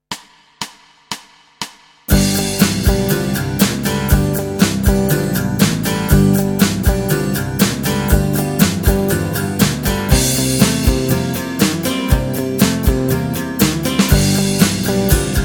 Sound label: Riffs G